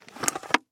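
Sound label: handheld vacuum cleaner sounds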